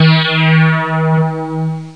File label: flanged, electronic sounds